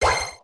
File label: ammo; Ratchet clank